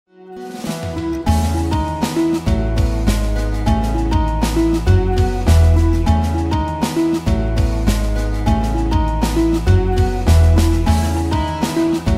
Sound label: Acoustic Guitar Unhurried